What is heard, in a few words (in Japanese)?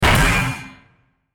大型ロボットの足音